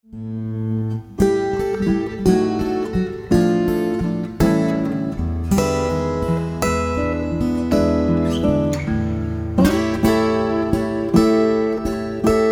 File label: Blues